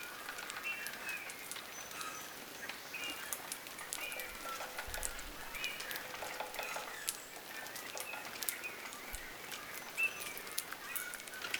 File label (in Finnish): punatulkun fiyyyy-aania; punatulkku ääntelee fiyyyy; Oli vihdoinkin paikalla siellä ruokinnalla pikkutikka